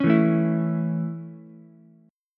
Db Minor Sharp 5th Db Ukulele; strummed; Dbm#5; JGuitar

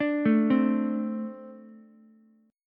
A Minor Double Flat 5th A, Ukulele; Ambb5 arpeggiated; 7,9,8,x; JGuitar

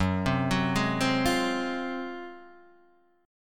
G sharp 13th F sharp

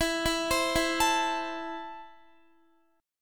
E Suspended 4th Sharp 5th E, down, Guitar; arpeggiated; JGuitar

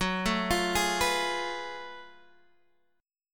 Gb Minor Major 11th Gb down Guitar Half Step Down

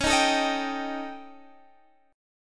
D sharp 7th Flat 5th C sharp

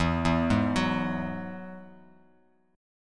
E Major Add 9th E down Bass Standard; arpeggiated; JGuitar

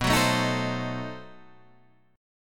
B 7th Flat 9th B Guitar Half Step Down

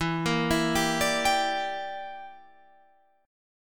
E Minor 7th Flat 5th, Guitar, Half Step Down

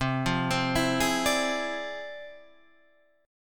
F Minor Major 13th, C

